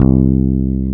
BASS C2, e-bass

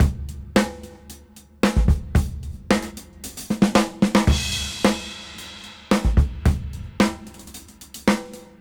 Fun 112 BPM; AMG; Keith LeBlanc Phat Butt Jams

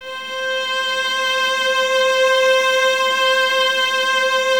violas C 5, String Ensembles; Miroslav Vitous; VLS 1+2

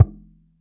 TURK SOFT; AKAI S-Series CD-ROM Sound Library VOL-2

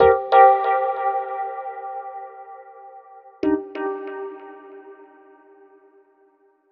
Dub Rhodes; KJ Sawka; Drum n Bass & Beyond

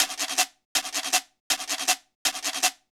Quiro, Latin Percussion

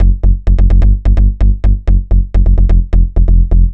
Jump Bass; Dr. Fink's Funk Factory

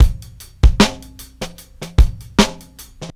76bpm, Hip-Hop Street Beats; Construction Kit; Street Beats by Poogie Bell; Downlow

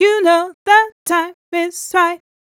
f mel d, fem Vocals

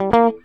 GUITAR

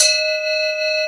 AGOGO; Big Fish Audio; Synth City

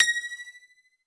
U220 FX Set, Drum Machines; Roland U220

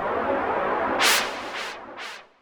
Sci fi

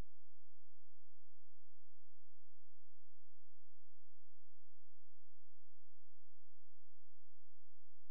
A#, Synclav FM; Big Fish Audio; SPACE1

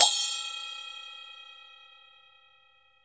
CHINA SM1 R, Heart of Asia; Spectrasonics - Heart of Asia CD2

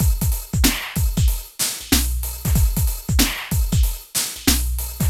PHATT BEA

